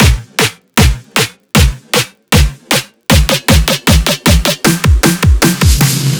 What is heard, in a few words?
fill loop 155 4 bars; GhostHack